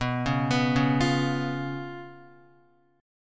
B Suspended 2nd Flat 5th B down Guitar; arpeggiated; JGuitar

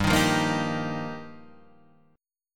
G Minor 7th Sharp 5th G down; strummed; JGuitar

